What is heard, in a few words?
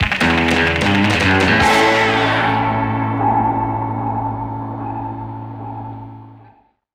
Goal Fanfare; crash bandicoot series sound box; Deep Sea Driving